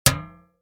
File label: impact metal can hit damped crunchy